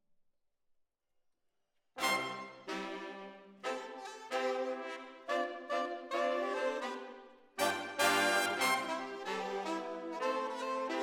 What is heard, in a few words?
ORTF, Jazz for piano